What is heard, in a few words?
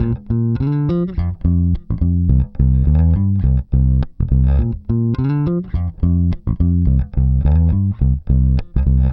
Pop, Bass